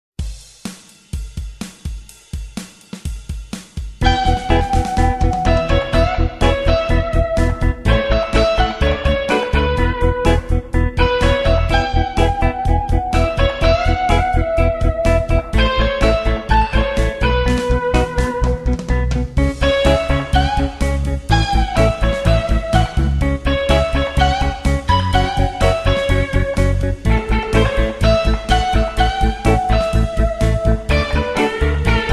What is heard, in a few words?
rock tutti